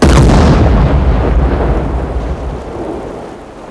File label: explode